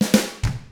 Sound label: ROCK, Drums; ILIO; Double Platinum Drums 1